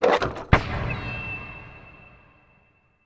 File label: taunt; dota; drow ranger